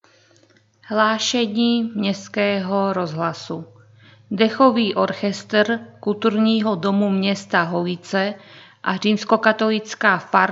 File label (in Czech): Dechovy orchestr